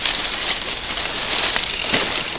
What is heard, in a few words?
fol move; svencoop